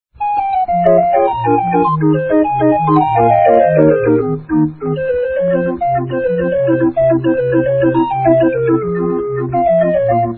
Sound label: Organ